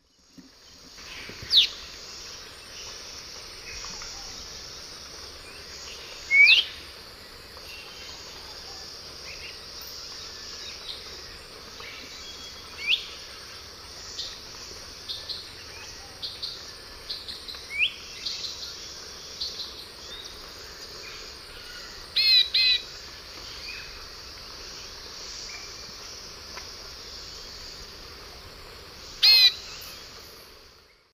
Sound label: Euphonia laniirostris, calls; S. America; Eastern Lowlands